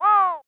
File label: crow